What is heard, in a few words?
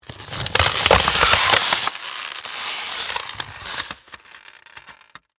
Texture, Crunch, Crush, Styrofoam